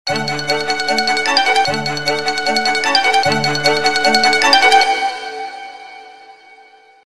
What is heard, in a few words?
classical; A3100